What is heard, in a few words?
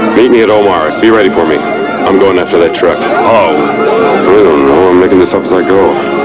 truck